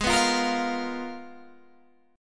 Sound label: G sharp Minor 6th Add 9th G sharp Guitar Open G, chord